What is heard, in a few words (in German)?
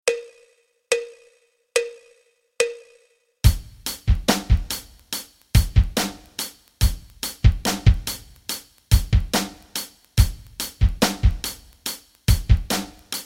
rock rhythmen